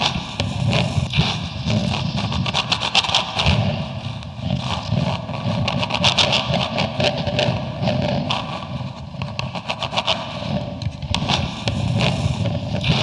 scratches loop; svencoop; rustworld

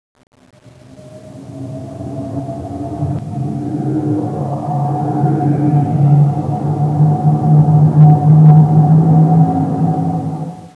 atmosphere; svencoop; sence; mistake coop

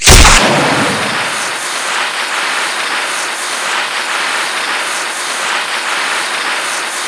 rocket; weapons; svencoop; echoes

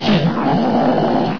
baby panther alert; svencoop; custom monsters

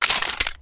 glock clip out; weapons; kuilu

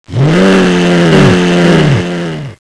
zombie pain; svencoop; sence; mistake coop

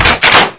cock; weapons; svencoop

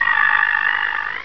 headcrab pain; svencoop

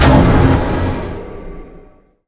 powerup; svencoop; morass beta

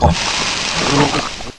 hurt; svencoop; AoMDC; davidbad

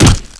bullet hit; weapons; svencoop; echoes

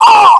pain; svencoop; scshogo; fallentrooper

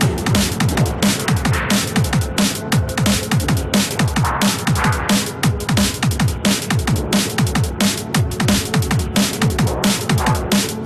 empty town loop; svencoop; sence; source of life

